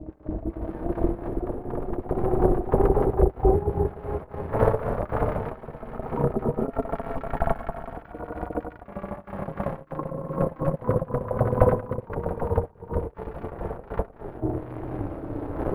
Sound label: grain